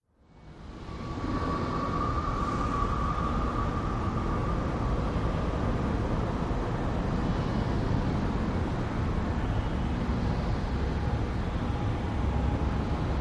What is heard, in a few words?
Parking Structure, big city